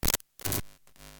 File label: Interference